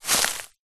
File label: footstep single grass thick dry; foley; zapsplat